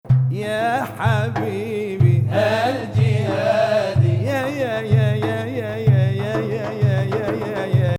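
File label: Ajam Saba 6-8, Arabic music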